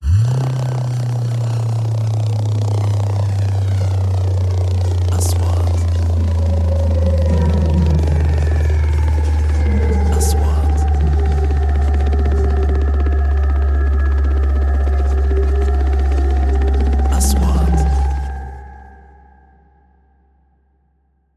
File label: helecopter